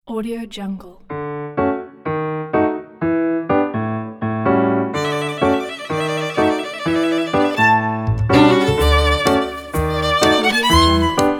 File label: jazz piano violin